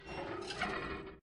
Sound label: Bridge Creak; Lethal Company; Windows